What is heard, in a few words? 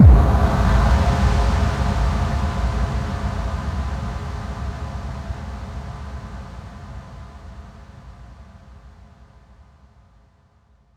FX Kicks